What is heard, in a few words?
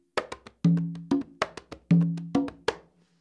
tumbao, congas